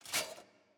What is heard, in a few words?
ambience room armor training